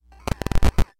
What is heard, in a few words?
bonus glitch